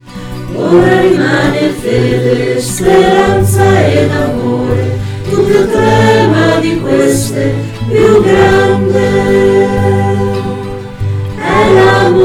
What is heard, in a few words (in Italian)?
Canto